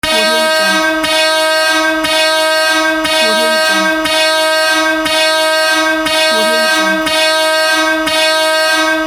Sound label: alarm